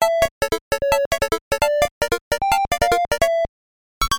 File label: stage clear; donkey-kong